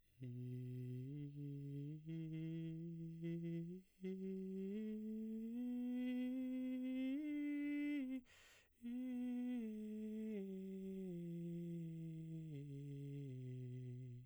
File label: scales breathy i, vocalset